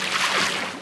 wade, footsteps; hl2

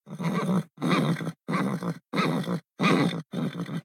HORSES - Snort; G4F SFX

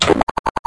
wpn hud on

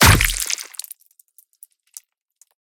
DESIGNED CINEMATIC CHOPS, BLOODBATH; PMSFX

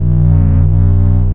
drone, turret floor; hl2

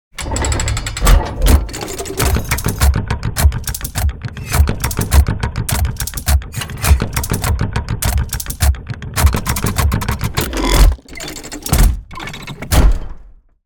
GEARBOX - DESIGNED - LARGE - Cylinder Press; Original Helmsworth Cylinder Press; Rock The Speakerbox

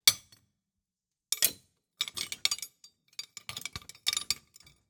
Various Metal Handling, The Blacksmith; SoundBits

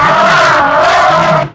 throw, zombie poison; hl2

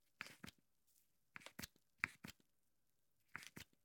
Hair Care, Curl Cream, Dispenser, Pump; UberDuo; The Bathroom Audio Playset

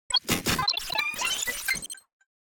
Device Computation Glitches; Sound Spark LLC; Forge Sound Design Toolkit

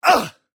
Man, Pain Hurt Grunt Big; Fight Vocalizations; EMOTE; Articulated Sounds

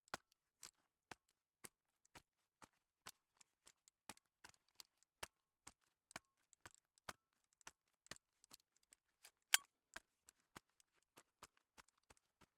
Hämmerli 280 - Grabs With Gloves; Pole Position; Hammerli 280 22LR; VAR SFX

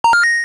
Coin, Video Game SFX; fascinatedsound

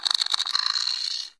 pod hit; hl2

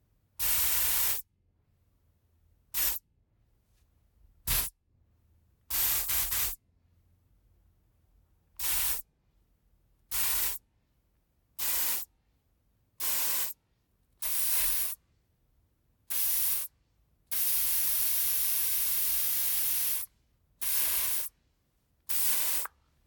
props deodorant spray mono; Soundholder; Props Box

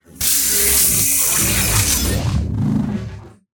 Droid Weapons; Unreal SFX; Battle Droid

